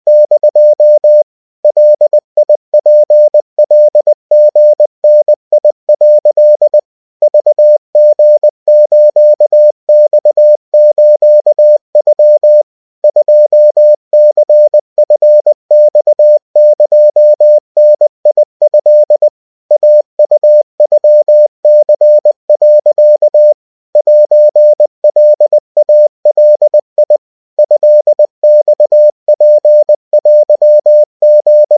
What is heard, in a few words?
Japanese 70cpm